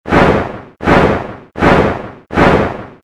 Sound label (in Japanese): モンスターの羽ばたき 4回 速め モノラル